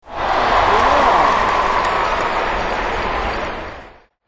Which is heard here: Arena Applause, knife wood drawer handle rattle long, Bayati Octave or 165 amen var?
Arena Applause